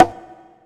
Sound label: bongo; bongo2; Donkey Kong Country Returns HD